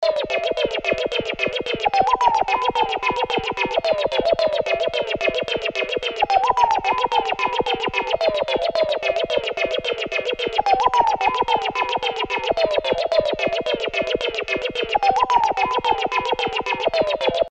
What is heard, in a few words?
loop 110